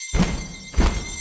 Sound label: wild Waiting Loop; netent